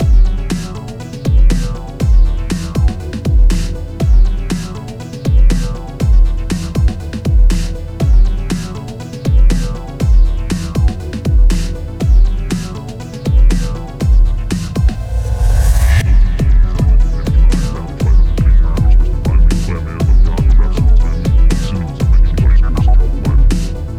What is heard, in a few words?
Wheel Music; Feel